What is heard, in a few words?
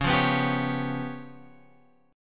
C sharp 7th Flat 5th, chord; C#7b5 Chord {9 8 9 X 8 9} strummed